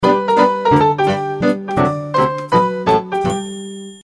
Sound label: Retro Power Down; LG U370 Disney Mobile; On / Off Sounds